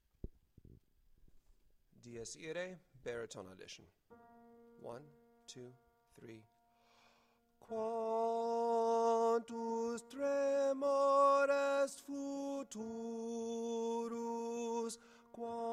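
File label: dies irae baritone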